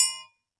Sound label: Triangle Hit Close